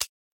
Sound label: button click; netent